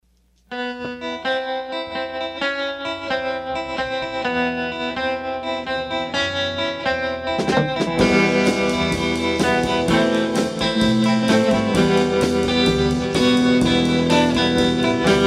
Hamer with P90 into Normal channel with reverb and tremolo